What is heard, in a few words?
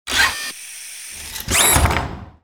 transfer start, ships